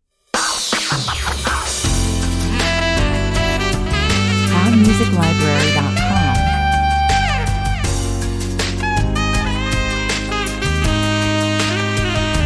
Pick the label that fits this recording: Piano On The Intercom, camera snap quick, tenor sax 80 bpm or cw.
tenor sax 80 bpm